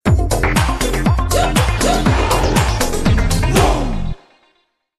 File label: STAB